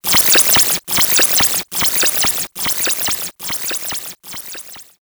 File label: Title Transition Data Transmission Terminated; Production Element Title Transition